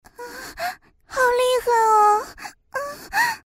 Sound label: moan; Imperial Girls